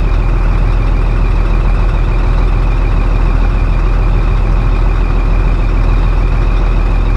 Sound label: DC 13 ext 800, volvo 9900; Vehicles; AI volvo 9900; Freyfurt